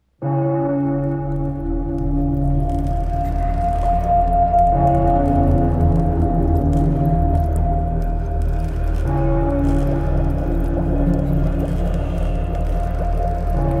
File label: Ghost Sounds